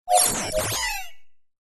science fiction computer console gui robot complex beep